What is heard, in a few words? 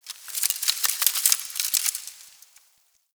Foliage Rustle, Ambience; Warframe; The New War; Zariman